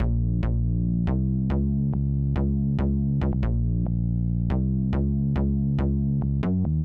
bass riff Bb-C1-Db-Gb, grime, 140bpm; Zero-G Sound Sense Grime Scene; Construction Kits; Its Primetime

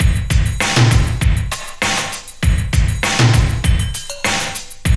break; Zero G - Man Machine

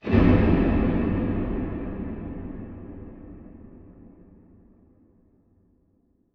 Huge Verb Tail, Long Reverb Tails; impact samples

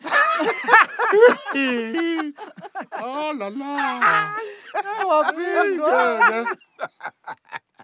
Vocal Chirp, African Voices

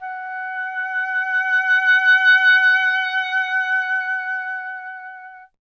oboe F; Miroslav Vitous; Solo Instruments VOL-2

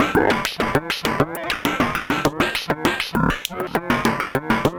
perc 100, Percussive Loops, 100 bpm; jamboree

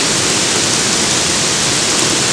RAIN; AKAI S-Series CD-ROM Sound Library VOL-6; WHEATHER

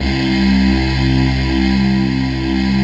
choir C2; REBECHOIR; Synth

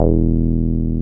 303 SQR E2 MP, SQUARE BS

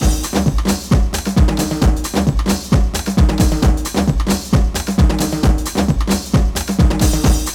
DRUM, 132; Megabass Remix! 2

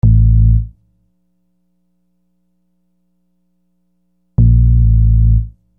Groove Bass, Hip-Hop Street Beats; Construction Kit; Sonic Foundry (Sony Creative Software)

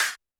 Snare, Roland TD-20

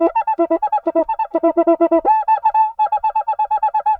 CUICA, DRUMS SAMBA; Best Service ProSamples vol.14; AKAI

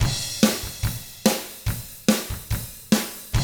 ROCK, Double Platinum Drums

